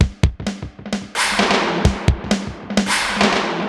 Space Echo HR-16B 130BPM; musicradar; cyborg beats

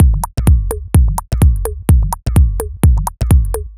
D 127bpm, House Drumloops; Zero-G House Fabrik Drumloops; ZG Drumloops

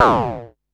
Perc Synth Raw; sci-fi

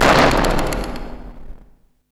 CYBERGUN R, Hard & Loud Techno; USB Soundscan vol.01; AKAI

